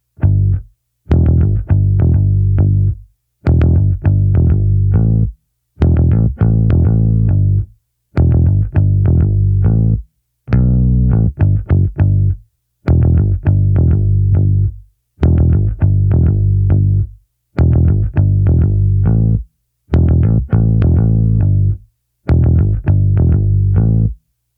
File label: Bass